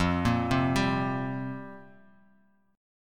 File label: F Suspended 2nd Suspended 4th F down; arpeggiated; JGuitar